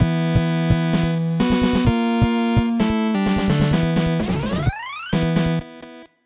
Scene Clear; Teenage Mutant Ninja Turtles II The Arcade Game

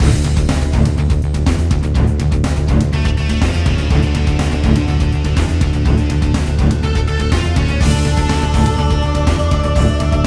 Flaming Castle

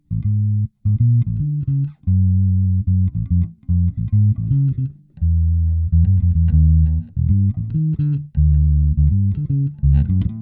Jazzy Band Practice

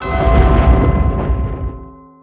Telekinesis Correct

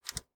CD Tray Open; this is the police pc

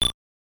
Hard cover hit; Gradius; MSX

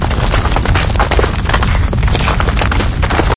Earthquake Loop

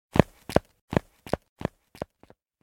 Mannequins Walk

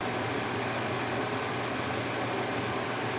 Prop; Learn to Fly 3

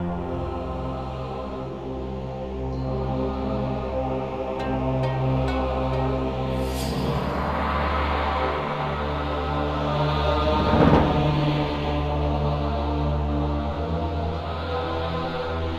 tension chant; the warriors 2005 ps2; huns tension chant

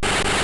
Sfx Slide; Pizza Tower early build test ost

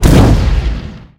tower attack; SFX Fatman tower112

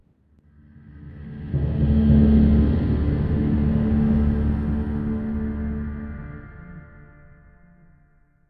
Progress Stinger; mass effect 2; Freedom

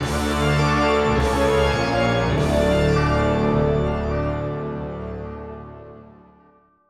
Fanfare

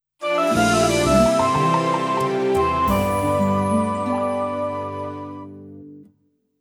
Glider Success Jingle

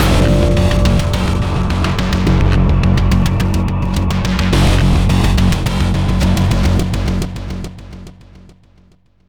Music Tense Stinger

